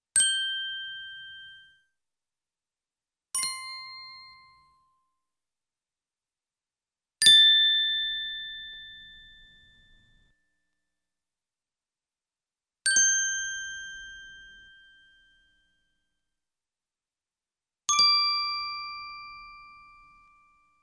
Small Bell Ding, Cartoon; Hollywood Edge; Cartoon Trax

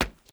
boots cement; Apotheorasis

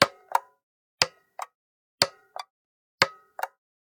Generic Button Plastic Medium; boom library; The Interface

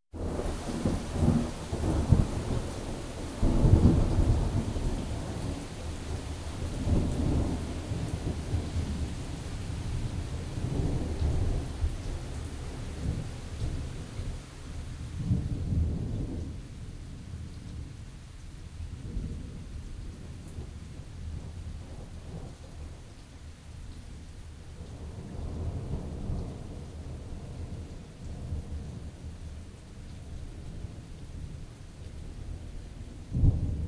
Thunder and Rain; 1001 Sound Effects; Halloween Horror Sounds Effects

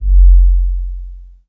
Menu Low Tone; Menu FX

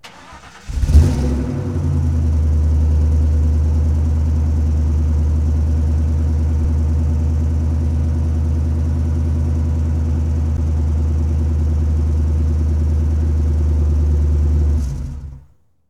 Truck Explorer Start Idle Off; Trucks

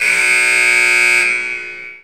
Basketball Buzzer

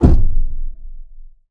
impact car hood inside; Blind Drive

